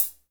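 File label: hi hat; Best Service Gigapack 2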